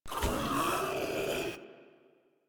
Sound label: Vocal Pain; Warframe; Proto Infested Ancient